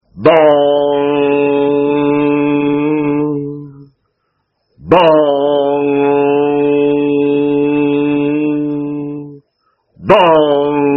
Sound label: gong